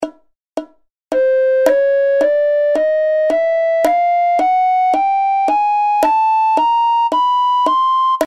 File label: chromatic scale, recorder